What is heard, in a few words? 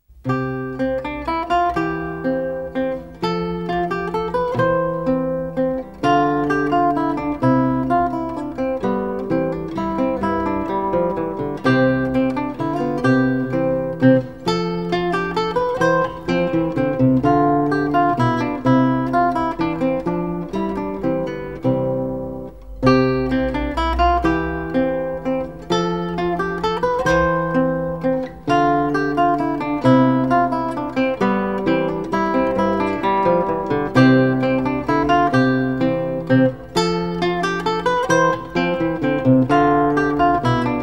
minuet